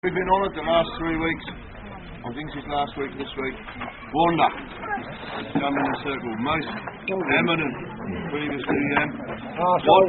Tune; Jerking Off In Silence

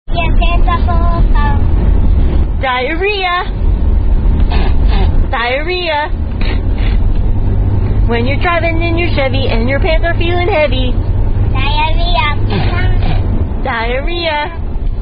Diarrhea Song

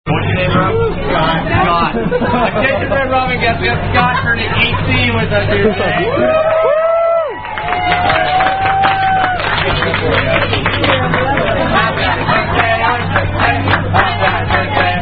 Happy Birthday Song, Happy Happy Birthday; Red Robin

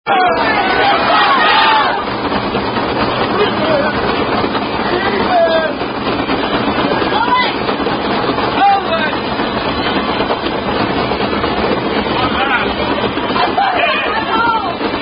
Playing Thumper, Signs Indian Drinking Game

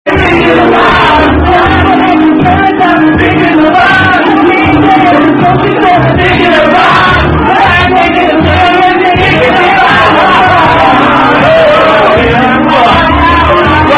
Aussie Song, Aussie Aussie Oy Oy Oy

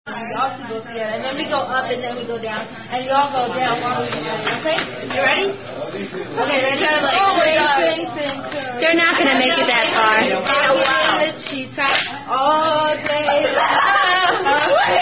Hand Clapping Game

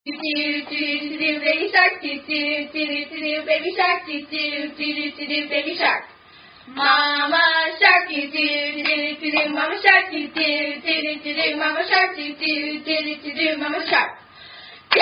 Shark Song

